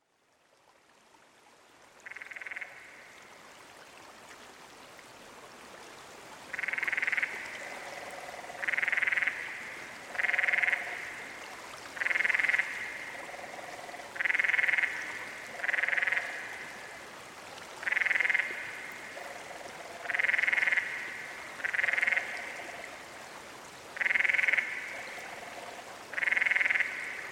Canyon Frog

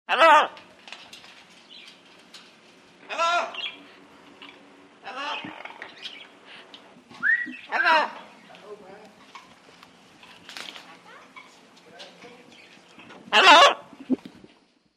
Parrot; SOUND EFFECTS1

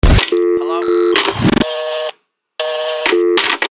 Contesty Stab; Best Of N2O Library; Stabs & Bursts

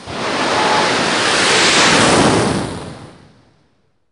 race car; 1000fx

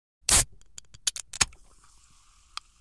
Soda Can Open w Fizz, Household

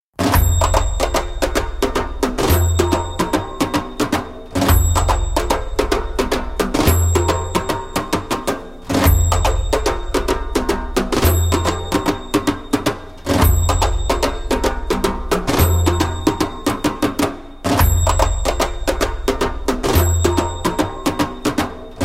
Djembolale

